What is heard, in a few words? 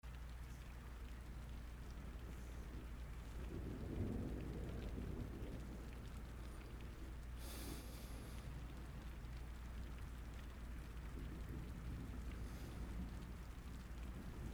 rain